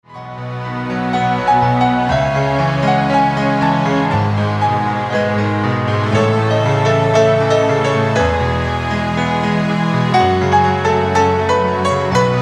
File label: Piano Version